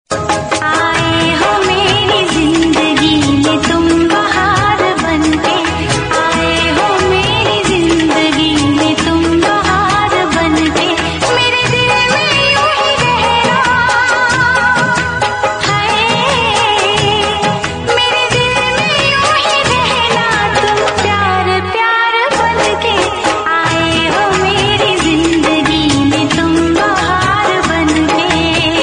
female

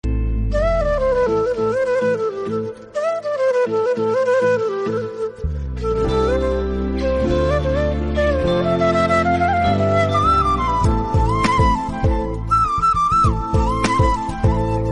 flute

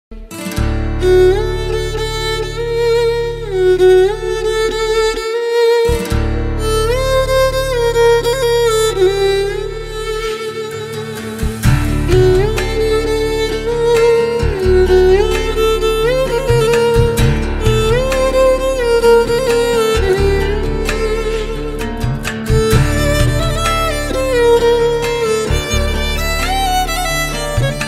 violin

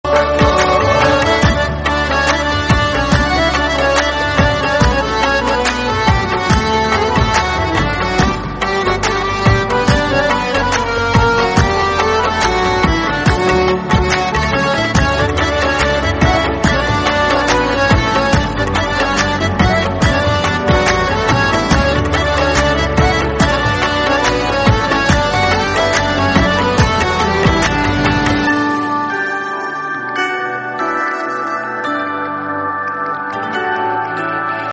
instrumental

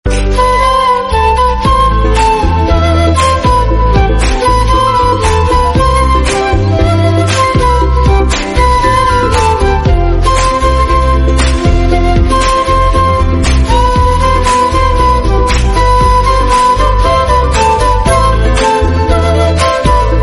flute

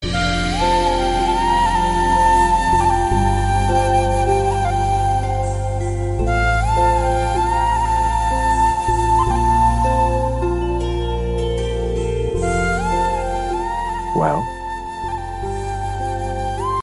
flute

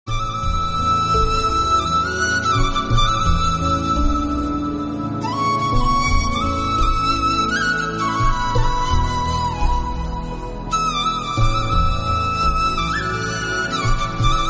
flute

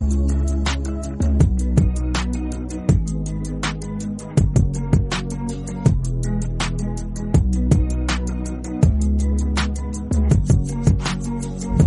instrumental

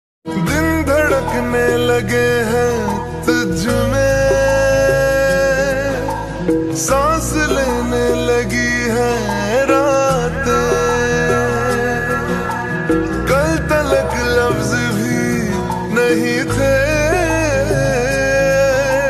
janib lofi

